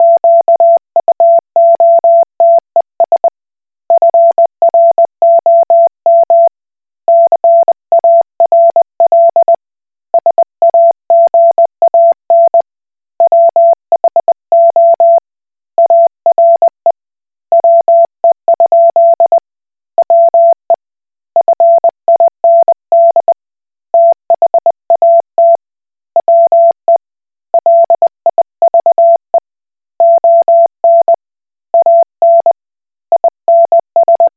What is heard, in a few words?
Koch Morse